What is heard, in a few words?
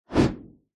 sound design whoosh short deep; zapsplat